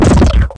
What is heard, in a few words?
beefy part of something; Gonner